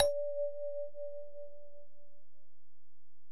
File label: Bell, Alesis DM-PRO